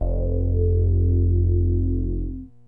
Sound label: DEEP BASS; Kid Nepro; Elite